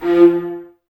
String Ensembles, Violas, VLS Detache

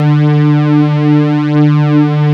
MIDI pad, synth pad; InVision Interactive; Lightware VOL-1; Instruments & Percussions

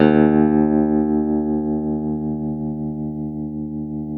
TELE TREM E, Guitars, TREMO; Spectrasonics; Hans Zimmer Guitars VOL-1; TREMO-LUXURY

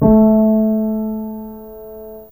Piano Hi A2; Fairlight CMI IIe

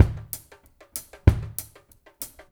ABORIGINL DRM SN L; Spectrasonics

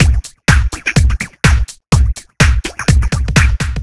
No Hat, Drum Loops 125bpm, Nu Dance Electro; Blip Jam

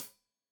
Hat Tight, Hi-Hat, Cymbals; Deeply Sampled Drums

